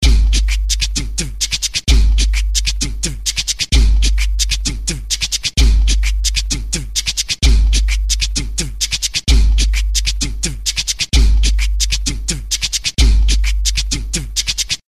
beat seco com grave

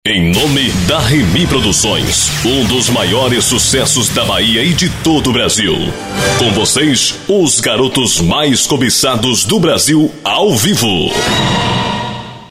vinheta da banda

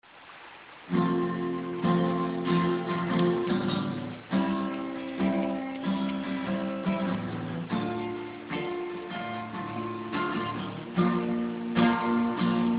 voz violao

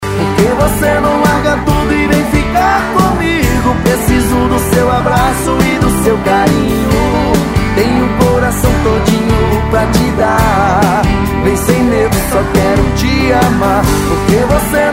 ring tone

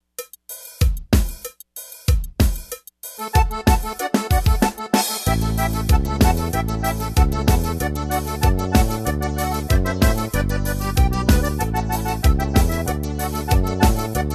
ritmos yamaha xote

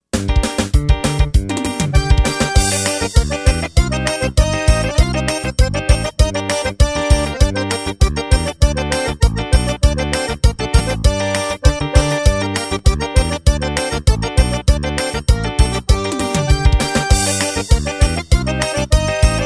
xotão; Ritmos Roland G70

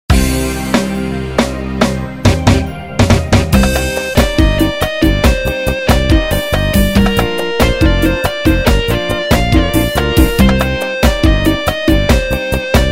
arrochadeira variacao introducao; afritmos

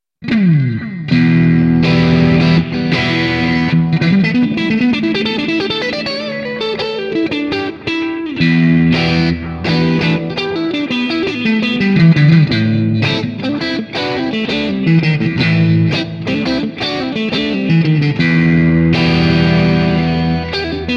em blues

